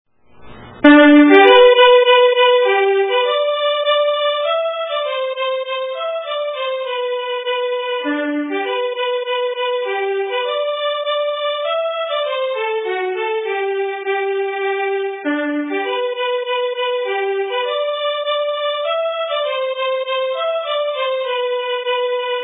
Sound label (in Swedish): Mazurka